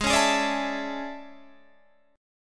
A Major 11th G sharp

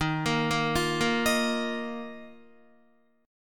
D sharp Suspended 2nd, Guitar; D#sus2; arpeggiated; 10,13,10,10,10,11

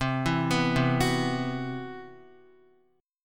C Major Sharp 11th, Guitar; arpeggiated; CM#11; x,3,4,4,0,2

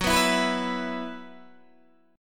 F sharp 7th Suspended 4th, Guitar, DADGAD

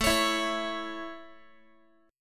A Major A, Guitar; strummed; x,17,16,14,17,x

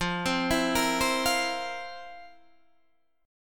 F Major 7th Suspended 4th F

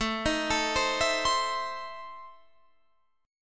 E Major 7th Suspended 4th Sharp 5th A

